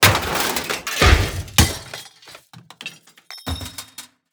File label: big crash; jungle-journey